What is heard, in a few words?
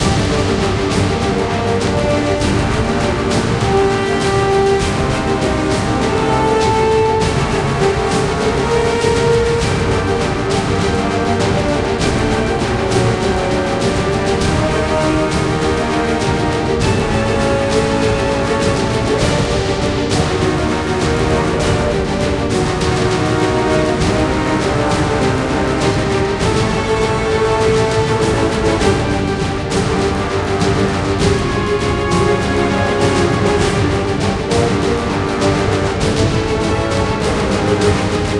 Battle High